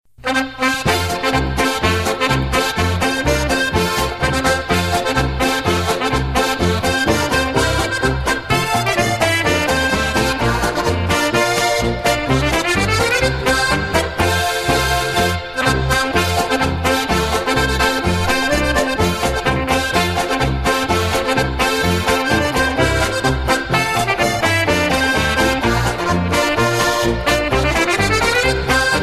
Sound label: polka hits